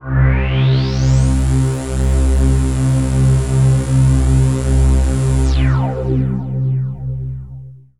RAVE PAD; Best Service; XX Large; Pads

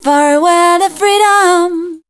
voice spectral, ly short

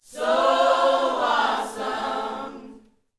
YOUTH CH PHRASE, Vocal Planet Gospel

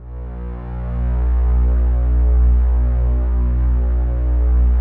ATMO PADS; Best Service; XX Large

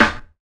SNARE; E-Lab; X Static Goldmine 2

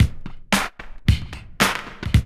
LOOP; E-Lab; X Static Goldmine 2; akai

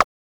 rim

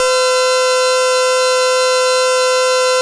Atmo Pads; Best Service; XX Large

